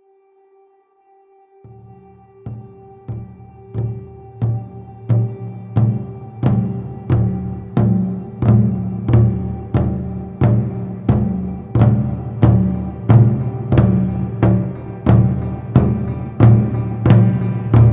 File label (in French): son tambour